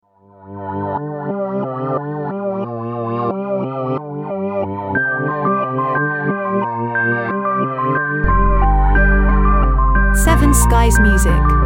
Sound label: piano atmosphere contemplative